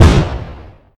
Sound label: Exploding platform; Doodle Jump; 3DS, Android, Arcade, DS, iOS, Mobile, Xbox 360